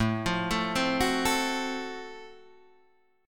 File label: F sharp Minor Major 7th Flat 5th A